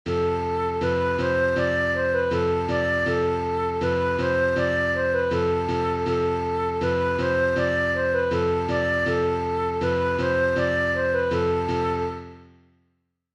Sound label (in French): Mirroir flûtes; Branle